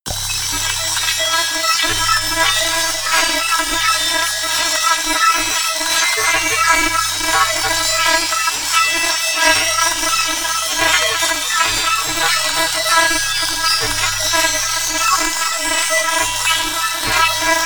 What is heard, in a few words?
atmosphere crazy didgeridoo